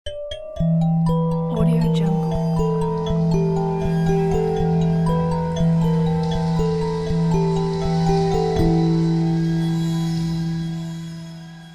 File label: evil lullaby logo